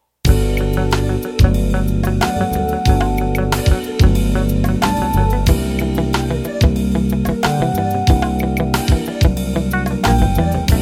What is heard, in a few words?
16beat funk